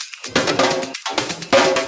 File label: Minimal Fills, Minimal House; Minimal Loops